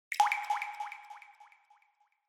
Water Drop Low Delay

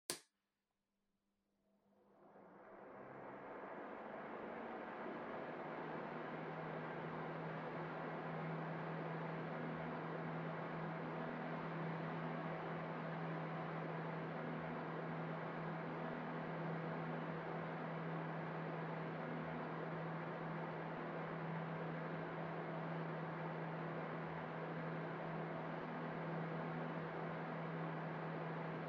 Air Circulator Far Low

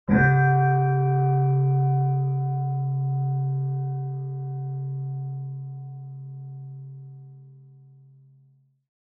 Buddhist Temple Bell

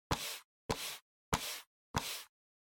PC Mouse Move Soft Pad Hit

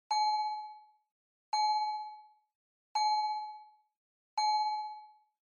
Fire Truck Siren Fake Bell

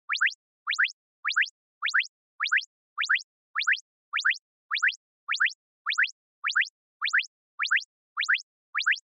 Panic Fast; Onoma

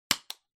Stapler Staple

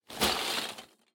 Loose Fill Packaging Hand Out Strong; 梱包材

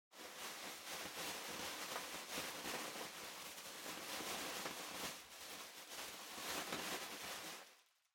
Packaging Air Cushion Far Rustle; 梱包用エアークッション01-16(遠い ガサガサ); 効果音＞梱包材

